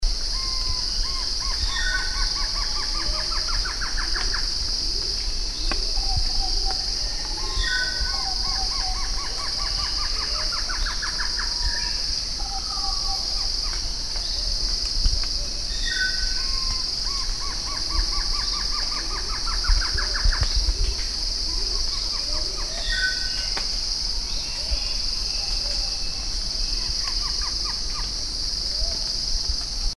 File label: biak paradise kingfisher